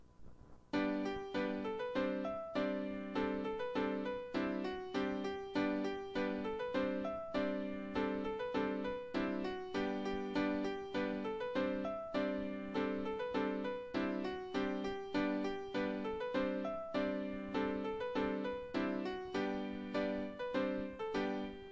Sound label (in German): Bourree, klavier